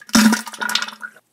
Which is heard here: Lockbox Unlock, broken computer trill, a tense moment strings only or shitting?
shitting